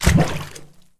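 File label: water active strong gurgle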